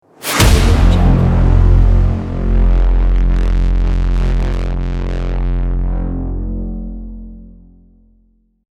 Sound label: cinematic braam